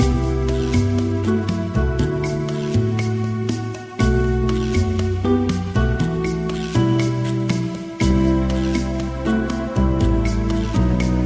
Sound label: Instrumental English Song Ringtone